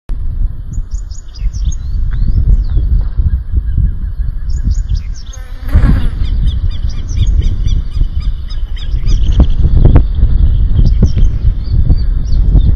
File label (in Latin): Anthus correndera; Cerro de la Gloria